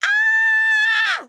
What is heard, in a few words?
Scream; Devour 2021 gamerip pc macos